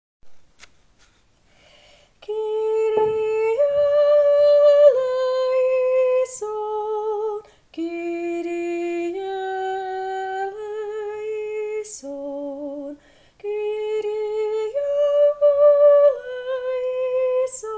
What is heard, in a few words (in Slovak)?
Kyrie sopran; spevokol